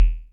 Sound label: kicks, Bass Drum